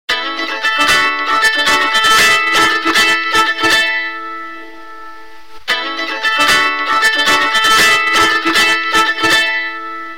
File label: Nice Music